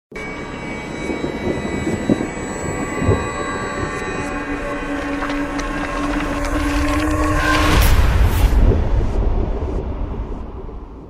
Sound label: horror logo music